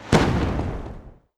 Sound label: betline End Explosion; netent